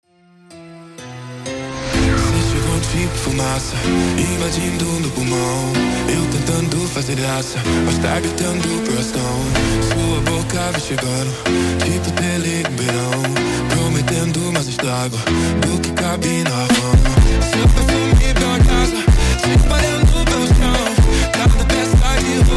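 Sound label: slowed, phonk music; funk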